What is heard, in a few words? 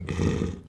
uruk hai neutral; spawners mobs